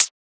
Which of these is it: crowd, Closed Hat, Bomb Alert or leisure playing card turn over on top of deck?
Closed Hat